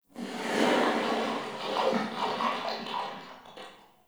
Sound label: Digest Interior Small; Ambience; Warframe; Sentient Lair